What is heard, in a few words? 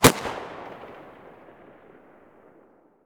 usp45 muzzle mono far, weapons